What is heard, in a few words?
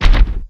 Triceratops Run